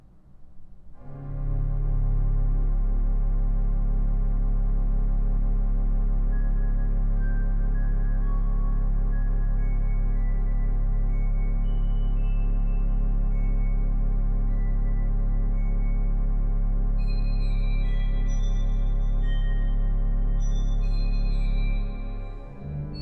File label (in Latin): Intermezzo II - Antiphon Pie Pater Dominice; Vesperae in honorem Sancti Dominici; Orgelimprovisation